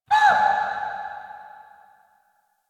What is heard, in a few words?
Deer Ruckus Vocal; Warframe; Quests; Entrati Labs